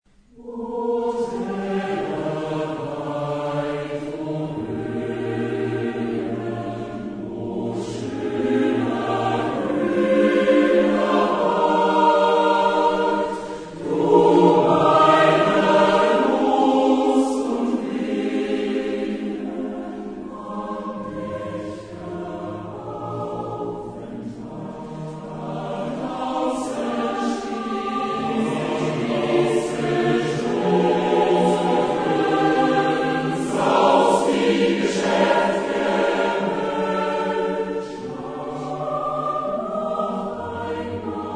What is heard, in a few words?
Lieder, chorus; Mendelssohn; 6Lieder Op59; Abschied vom Walde